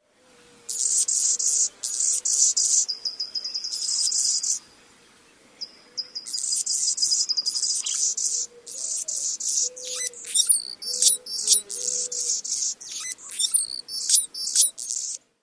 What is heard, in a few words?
song